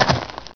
LEAVES

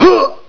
hit; BattleSNDS

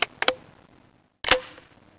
LOAD UNDERSLUNG GL

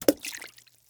Splash

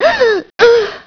DYING; BattleSNDS

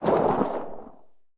echo building

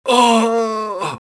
DIE; BattleSNDS

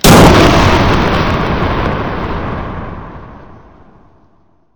DEMO C4

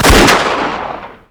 50 CAL, Weapons

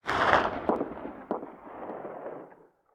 echo hills

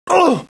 hit; BattleSNDS

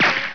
RICOCHET

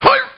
hit; BattleSNDS

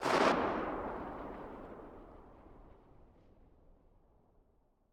echo dense

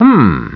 humm; BattleSNDS

